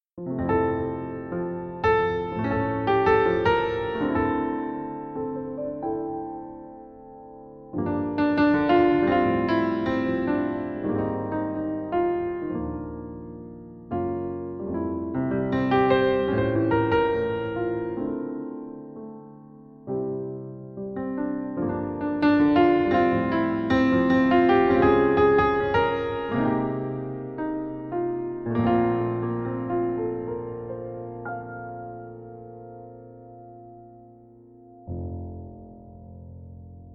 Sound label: jazz pianist jazz improvisation